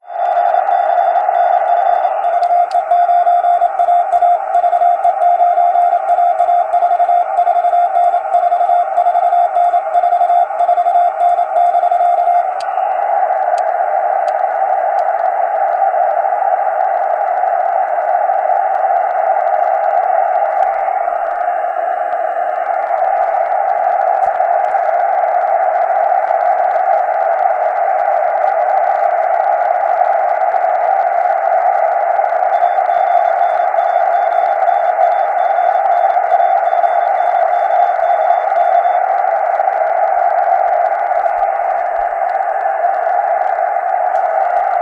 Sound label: 50-70MHz